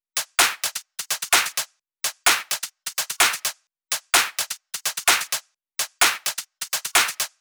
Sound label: Shuffle Top Drum Loop, 128 BPM; Drums; Loops; Cymatics; EDM Starter Pack